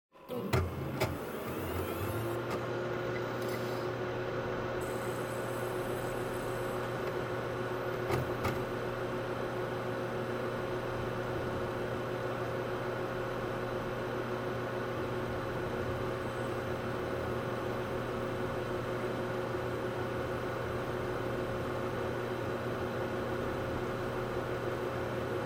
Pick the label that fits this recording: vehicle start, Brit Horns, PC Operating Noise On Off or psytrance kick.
PC Operating Noise On Off